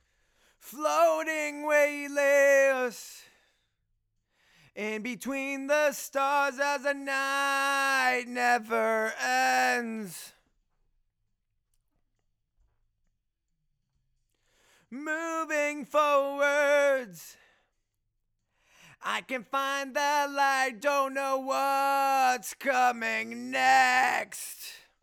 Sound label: Chorus